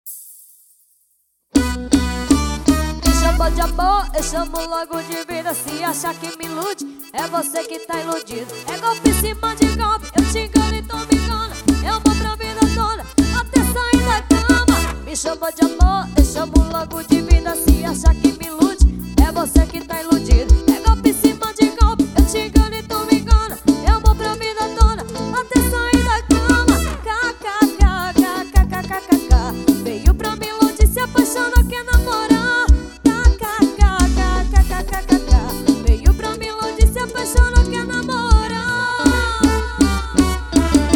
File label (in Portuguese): swing forrozeira